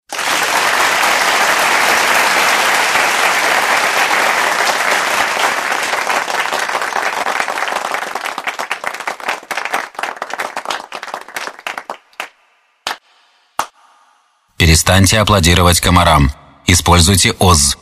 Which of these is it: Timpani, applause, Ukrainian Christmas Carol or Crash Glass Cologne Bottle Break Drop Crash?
applause